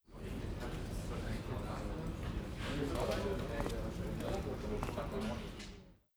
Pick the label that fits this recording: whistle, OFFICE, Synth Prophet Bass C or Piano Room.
OFFICE